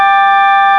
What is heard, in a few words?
SPACE 5THS, PAD